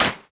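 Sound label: metal bump small; objects; Star Wars Obi-Wan; XBOX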